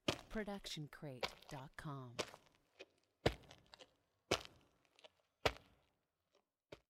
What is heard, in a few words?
stomping on dirty ground slow; soundscrate